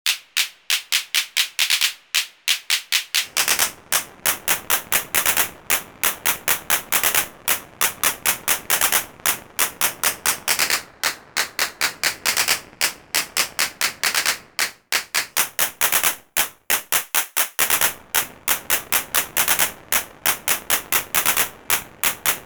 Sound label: CLAP CV Tone Audio Rate